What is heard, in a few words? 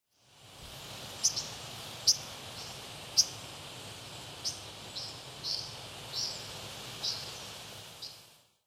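Brown Dipper; kawagarasu; nikko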